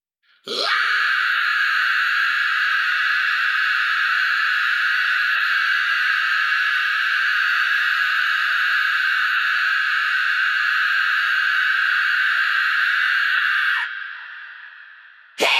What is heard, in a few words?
Vocals